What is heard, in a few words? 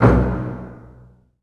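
Bass Spiccato B0; Digital Sound Factory Virtuoso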